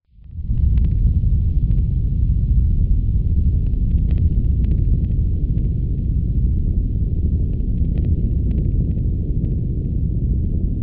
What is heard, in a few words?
rumble